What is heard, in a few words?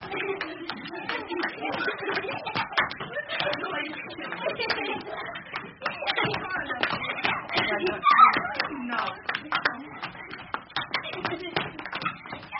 Nursery Kids Running Indoors